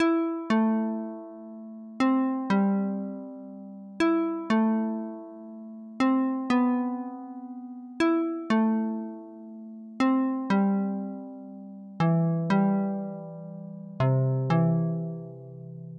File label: FM Harp (120 BPM)